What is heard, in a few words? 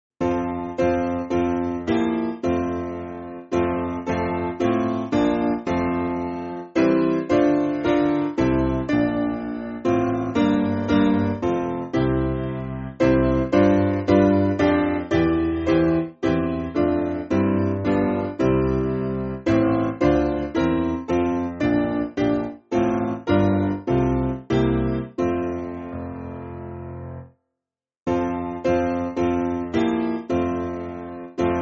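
Piano, Free Music Backing Files for Churches; O Praise Ye The Lord; Gauntlett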